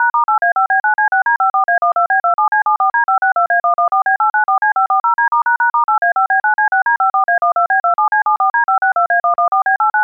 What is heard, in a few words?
Wardialer